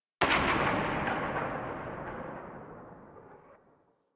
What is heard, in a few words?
Arch Gun Primary Beam Tail Ext, Weapons; Warframe; Archwing; Hildryn